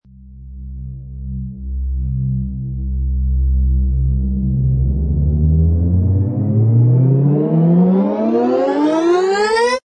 Long Medium Rise, Rising sound for sound design; Low Medium Rise; Rises and Dives

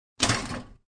Toaster popping.; Kitchen Sound Effects; Household

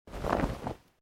Clothing Shirt Cotton Move

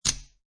Poker Chips Drop Two Chips Onto Another Two Chips; Gambling

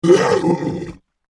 Horror Monster Zombie Growl; Zombies

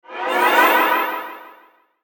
Whoosh Warp Twinkle Delay; Blastwave

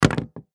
Thermos Empty Drop Wood; Household; Drops; Objects; Blastwave

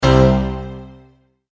Multimedia Tone-Stop

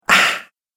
Human Male Ah Sound, exclamation; Exclamation Sound Effects

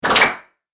Cartoon Magic Spell

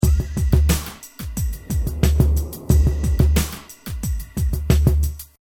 Hip Hop Drum Loop 90 Bpm Straight; Da Cryb Straight; LYN118 pack of Hip-Hop 90 BPM loop; Royalty Free Drum Loops

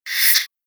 Fast Cutting Whoosh; Whoosh Sound Effects

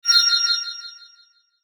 Sweeper Vibrato Whoosh; Production Element Imaging Element Accent Transition; Sweeper Sound Effects

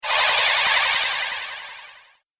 Mage Light Show, magic sound effect; Magic Sound Effects; Role Playing Game

